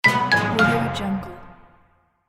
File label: soft fail